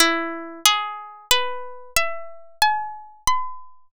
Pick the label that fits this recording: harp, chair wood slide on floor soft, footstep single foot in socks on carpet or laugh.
harp